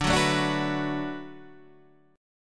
D 6th D down Guitar Half Step Down, chord

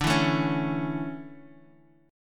D Major 7th Suspended 2nd Guitar; strummed; chordshape

